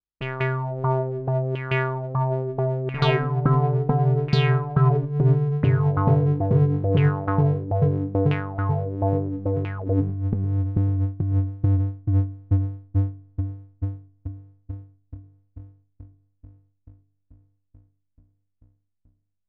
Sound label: Looped Envelope; Super Gemini